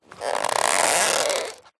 foley cardboard condensed thick squeeze crush squeak; zapsplat